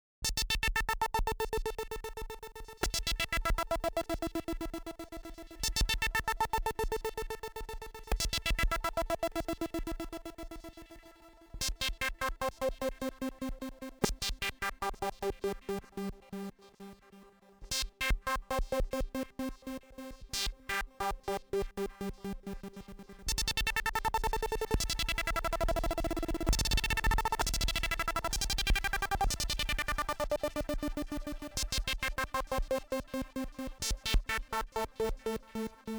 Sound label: MS20 stutter